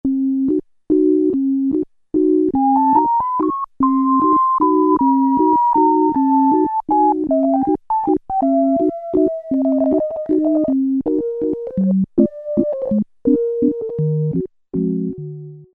Steam Pipe Organ; Factory Presets Bank B

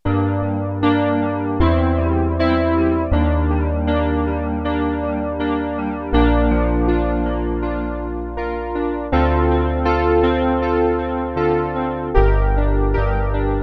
Piano, Roland JX-8P

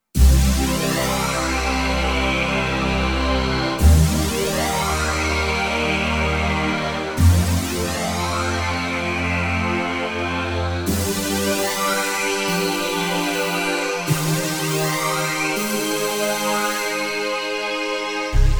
Huge Ober Sweep

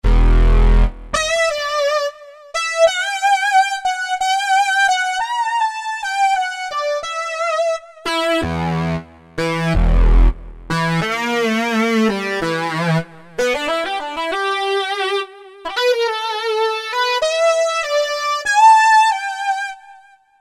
Wormy Lead; Roland SR-JV80-09 Session

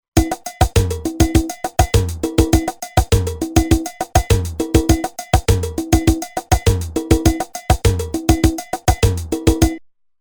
Samba, Roland TR-505; Factory Rhythms

